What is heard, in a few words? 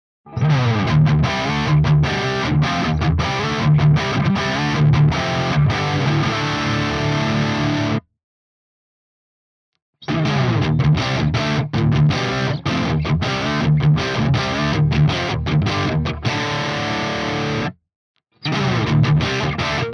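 RGT1270 rhythm all positions Riff, guitar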